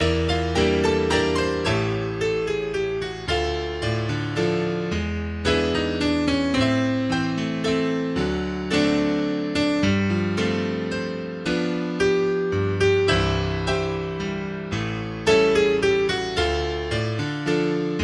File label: Waltz